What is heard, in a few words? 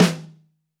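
Room Snare alt, Roland BK-5; Snares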